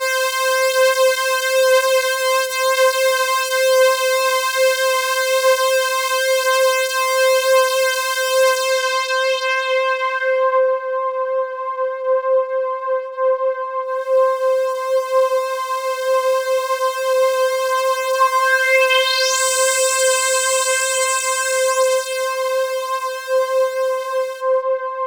C4, Roland JV 2080 CLUB ORGAN

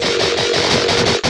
Treat Guit, Industrial Nasty

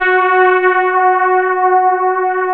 CMI ORGAN

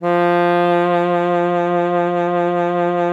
F3, ALTOSAX; Xsample VOL-2; 90 s Sample CDs

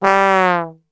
G 2 TRB, Brass Super Section; Best Service; 90 s Sample CDs

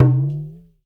SND TALK DRAB; Spectrasonics; Liquid Grooves 1 & 2

